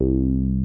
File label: TB303; Zero G; Man Machine